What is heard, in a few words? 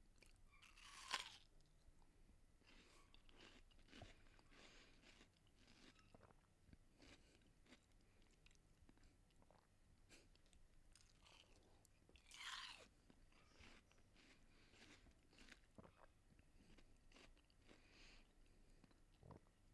Chew Soft Texture